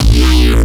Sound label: Bass Shot; neuro; BIG5AUDIO